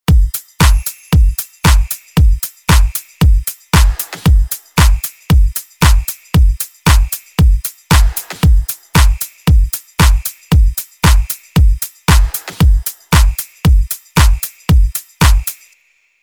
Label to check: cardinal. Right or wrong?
Wrong